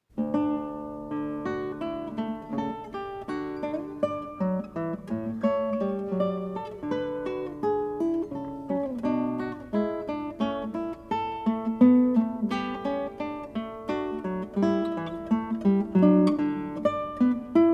baroque guitar - fughette; handel

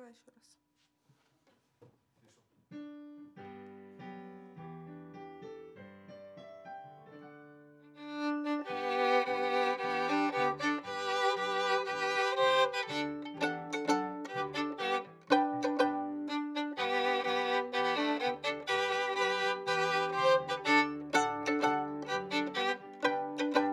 Viola D

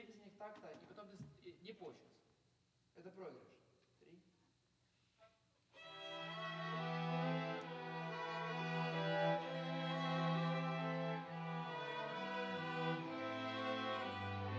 Vocal

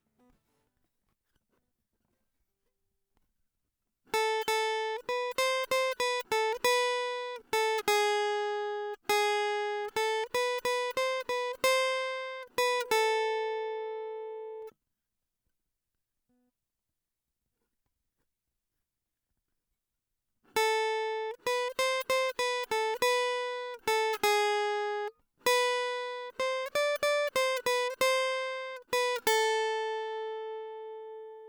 guitar nice

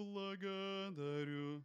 bass dyn

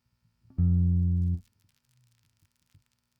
bass

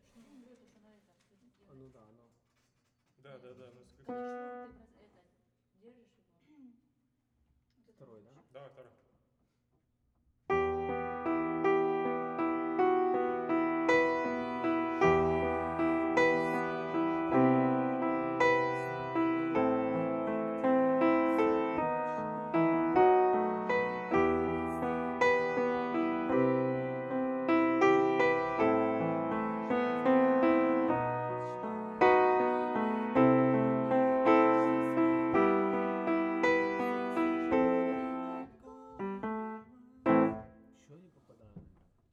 piano

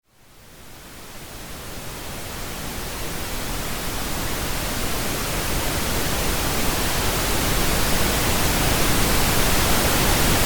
White Noise

